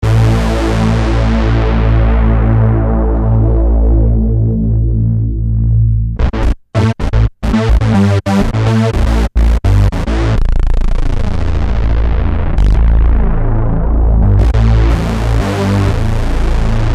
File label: roland super jx-10 sounds